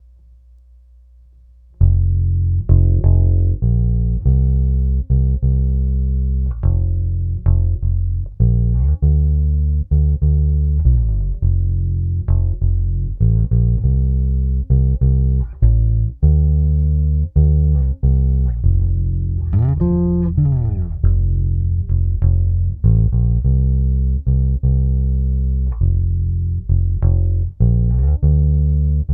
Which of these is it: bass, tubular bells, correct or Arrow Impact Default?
bass